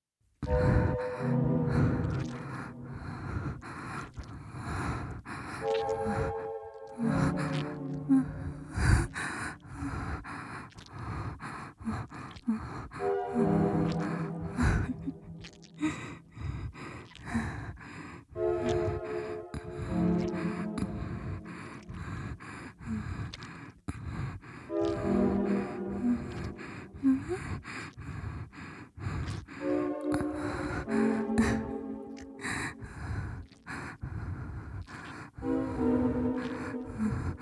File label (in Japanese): 乳首弄り サウンドトラック強